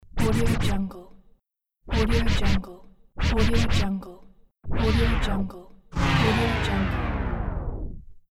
vinyl scratch

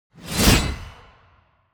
weapons sickle cut

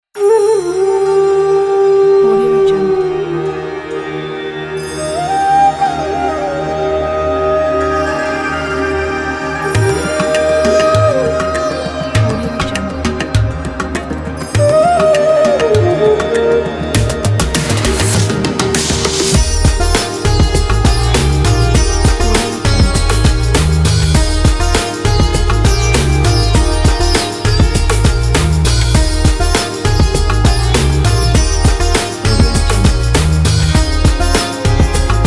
inspirational india pack

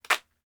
applause; prosoundfx

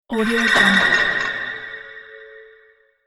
magic noises

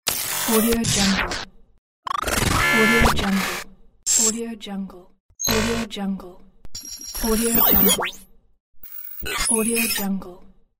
glitch sfx

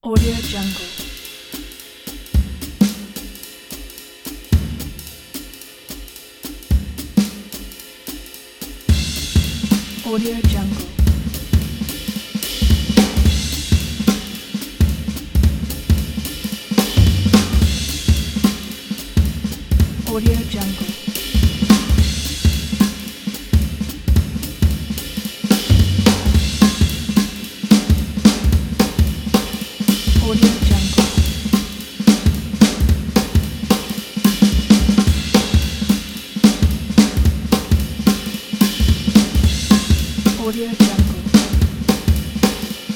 jazz drums

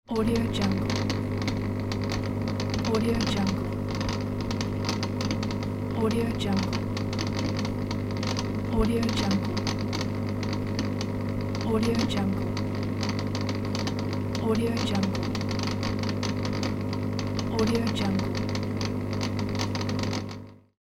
aquarium pump

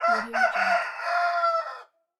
rooster; crisstyna

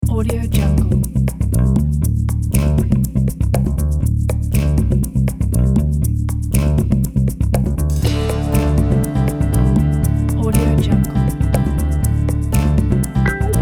uplifting retro funk kit

